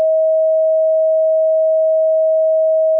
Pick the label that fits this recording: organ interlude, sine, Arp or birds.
sine